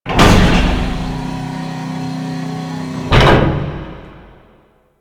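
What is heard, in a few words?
Cannon Pod Hatch Open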